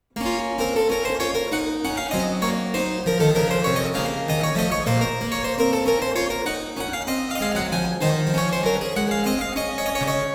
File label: French Suite No. 3 in B minor; Bach; BWV 814; IV. Anglaise; The French Suites